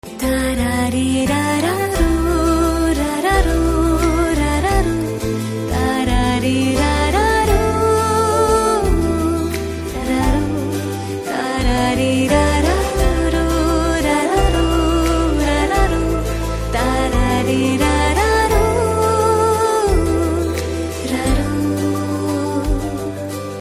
BGM Humming Ringtone; Shakunthala Devi

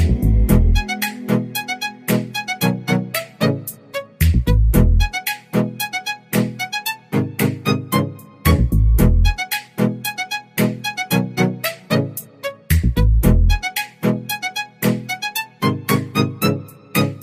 Instrumental Tone

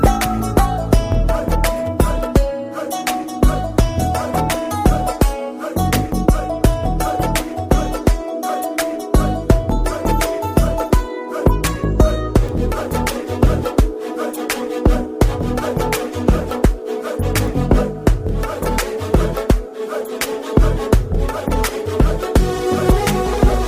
Instrumental Tone

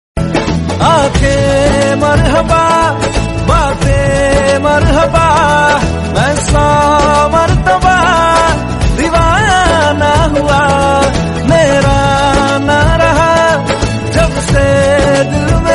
Male ringtone; Raees

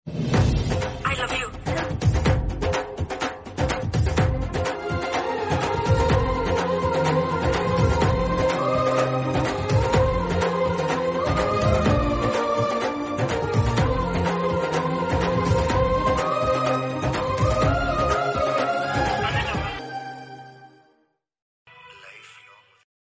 Telugu ROMANTIC BGM Ringtone

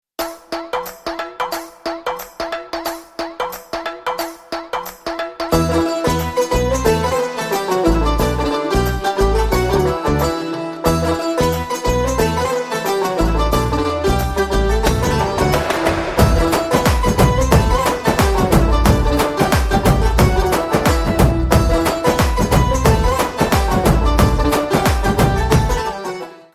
Drum Beats BGM Ringtone

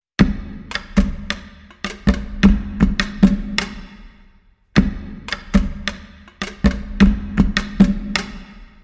BGM Drum Beats Ringtones; Master Telugu

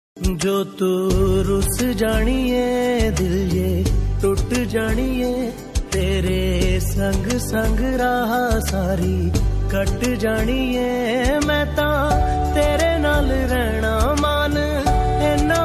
Romantic Number Ringtone

